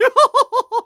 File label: LAUGH; The Wild Thornberrys Movie; DARWIN